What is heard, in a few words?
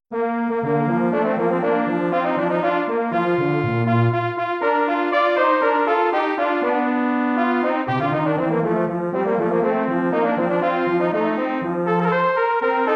Duett; Mueller